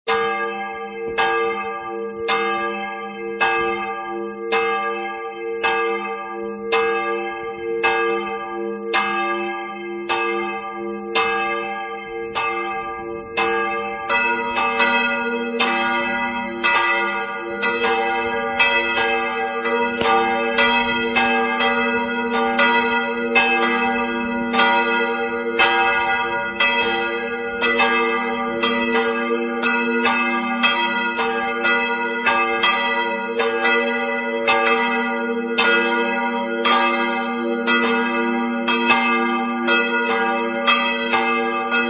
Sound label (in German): glocken; hergershausen